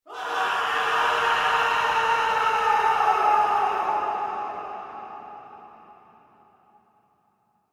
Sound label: Scream Horror Surreal Scary Fall Away; Heavy Reverb Terror; Screaming; Voice